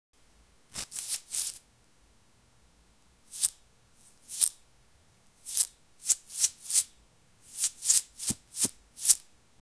chori karna, robbery

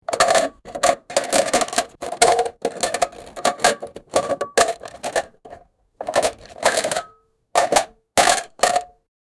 chillar paiso ko tin ke, coin

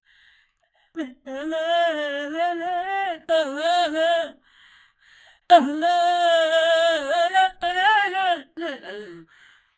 chadi marne ki aavaj, violence

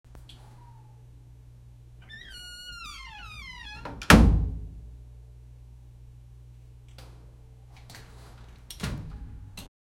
band kamre mein darwaza, door

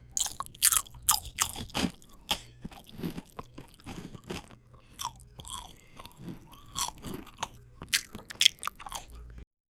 samose khane ki awaaz; indian

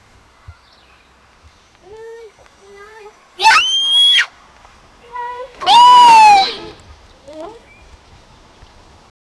jeep ki awaz, automobile

